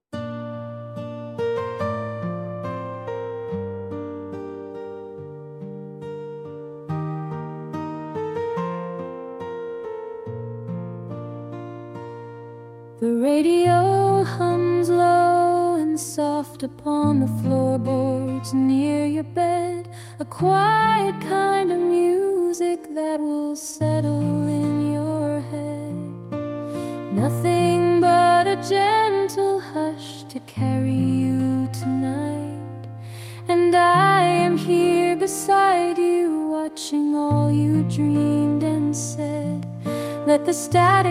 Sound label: lullaby, name songs; mira